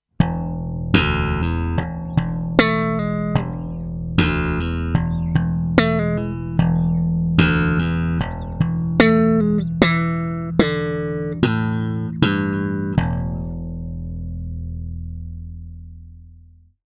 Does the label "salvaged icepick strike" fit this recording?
No